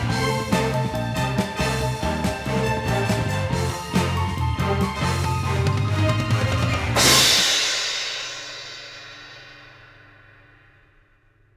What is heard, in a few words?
MUSICAL STING; HULK